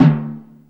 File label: TIMPANI HI